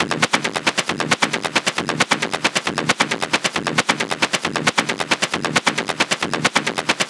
Perc Loop 135, Techno

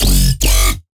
Bass F# Switchback, Dubstep; Bass One Shots; Ghosthack; Dubstep Sound Arsenal; 50 Bass One Shots

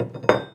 fx metal drops; Cartoon - film effects

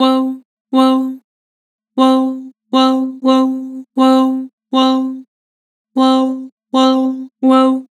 "Woah", Harmor Vocals

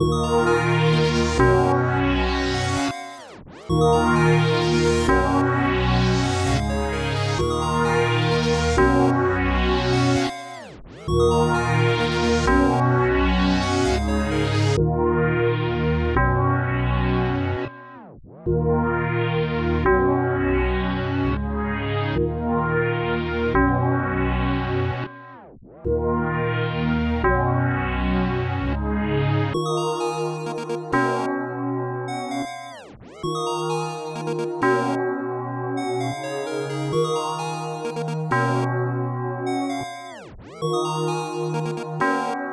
130 BPM D# Min; Run Dat; Wavy

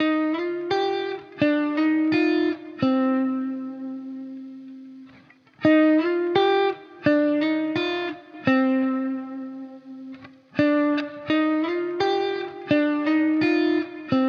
85 BPM C Min Guitar Harmonies; Loop Stems; Cymatics; Full Moon

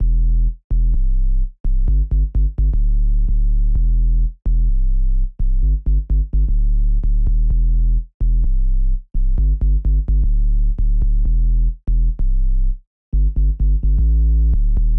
128 BPM E Min Sub; House; Drop Loops; Cymatics; Goliath

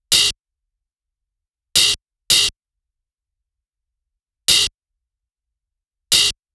Nu Disco Kit 110bpm Open Hat; EDM Drums; Drum Kits; Stems; Ghosthack; Ultimate EDM Drums; Nu Disco Kit 09

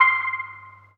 KEYS (C); One Shots; Vault; Cymatics; Mystery Pack Vol 4

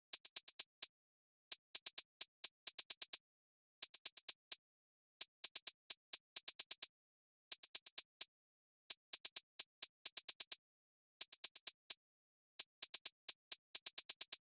Drum Loop - 130 BPM Hihat; Loop Stems; Cymatics; Vibes II Drum Loop Collection; Genie Drum Loop

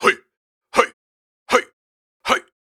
Vocal Rhythms 80Bpm, Viking Vocal

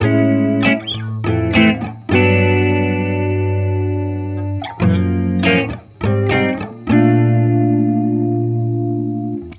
Xmass Guitar Loops 100bpm Amaj; Guitars; Ghosthack; Advent Calendar 2019

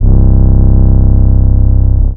Drill 808 - C; trap; 808s; Cymatics; Trap Starter Pack

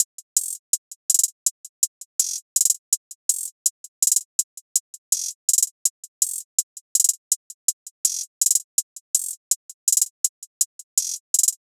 Hihat Loop - 82 BPM; Matrix; Cymatics; ANALOG EVOLUTION Beta; Hihat Loops

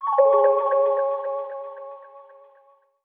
ACCENT Burst, 114 BPM F# Min; Ear Candy

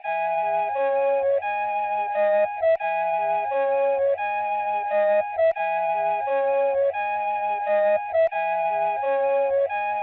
87 BPM C# Min Flute, melody loop stem; Trap; Loop Stems; Cymatics; Circus; 2022 Melody Collection

